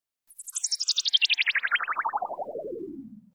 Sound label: INSECT, Special FX Twisted; USB Soundscan; AKAI